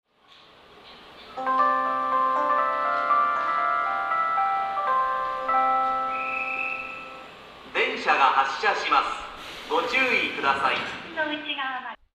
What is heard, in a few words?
melody; 4番線発車メロディ; seibu; nerima